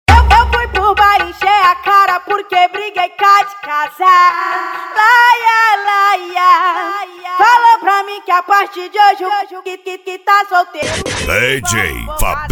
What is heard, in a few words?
funk mega bass